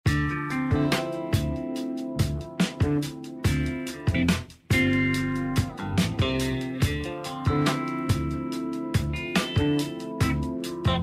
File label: Radio